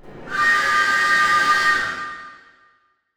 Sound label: Whistle, Train; OpenBVE